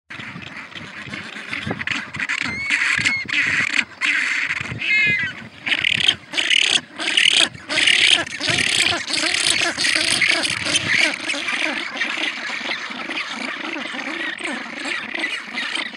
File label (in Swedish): Klipphopparpingvin